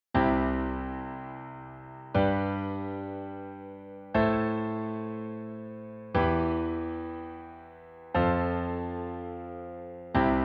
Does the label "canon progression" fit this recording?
Yes